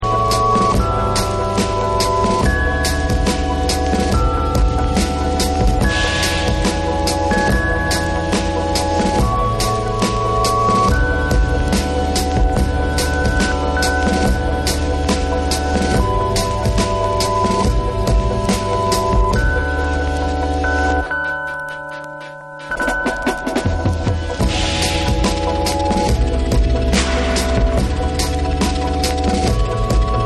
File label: Instrumental